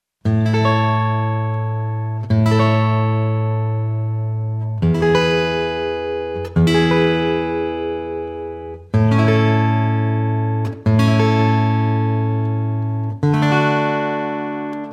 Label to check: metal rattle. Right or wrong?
Wrong